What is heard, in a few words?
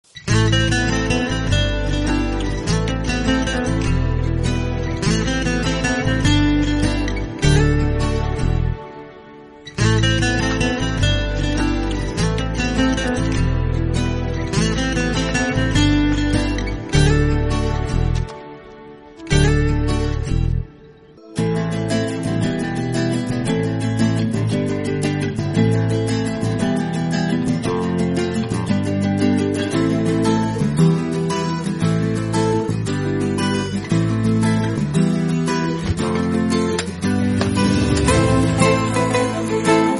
Guitar BGM; Colour Photo